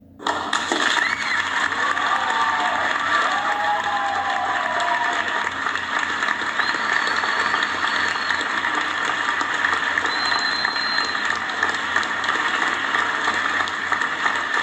aplausos y silbido

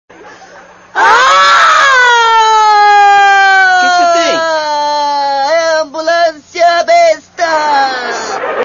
ambulancia

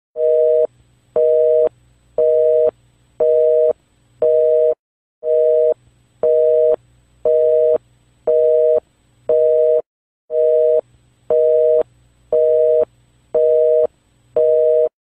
telefono

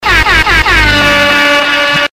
trompetas reggaeton

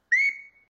flauta

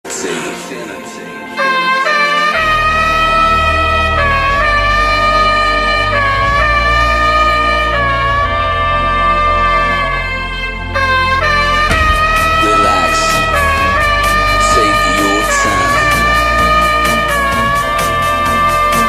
vuvuzela infinita

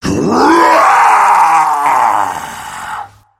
grito de guerra; atacar

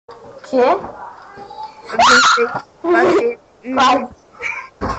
gritos

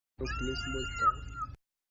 grito

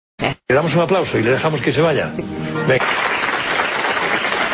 aplauso y adios

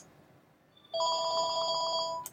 telefono

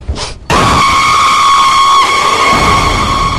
susto

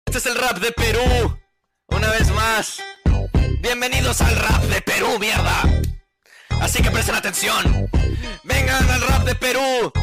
rap

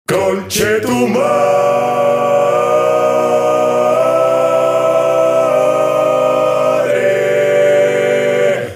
conchetumare cantado

